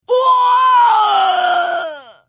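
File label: Hit, Khajiit female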